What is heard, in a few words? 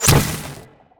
warp; garrysmod